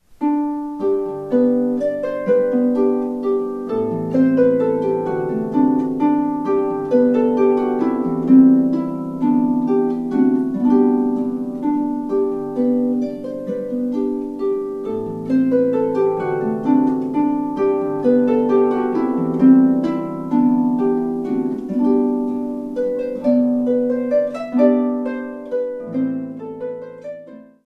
Welsh Air, harp

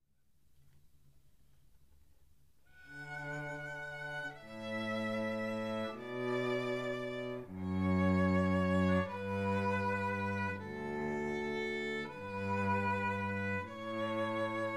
string trio

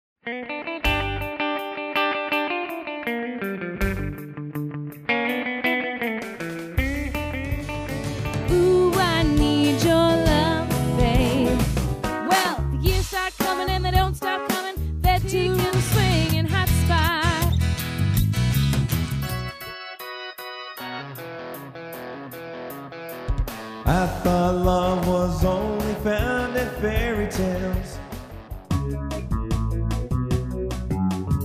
2 Person Band, blues keyboards

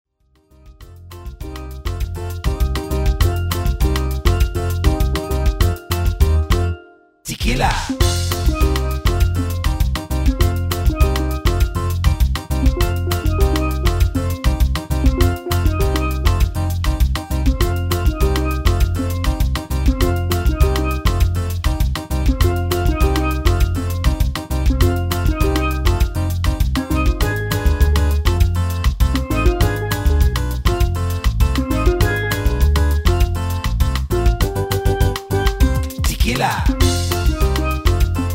steel drum

